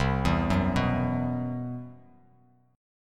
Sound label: C Major 7th Flat 5th C, Bass Standard; CM7b5 arpeggiated; JGuitar